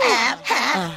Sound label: "ha ha", Voice